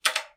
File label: keyboard